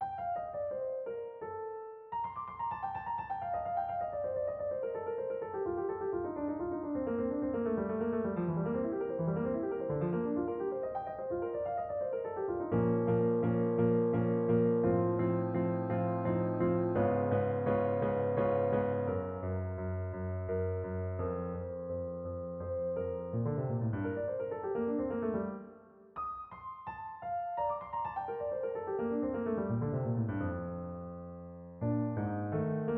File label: Piano sonata Primo movimento abbozzi